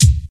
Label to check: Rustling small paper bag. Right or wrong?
Wrong